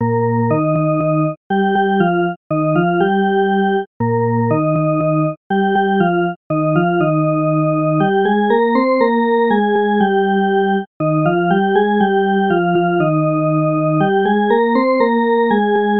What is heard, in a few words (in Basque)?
Orgue